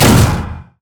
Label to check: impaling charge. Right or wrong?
Right